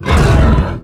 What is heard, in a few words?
Exertion Light; Evolve; Behemoth